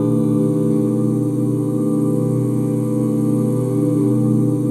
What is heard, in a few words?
OOH B SHARP9, OOH CHORDS, Vocal Jazz; ILIO; Vocal Planet; Jazz & FX